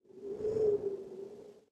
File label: Wind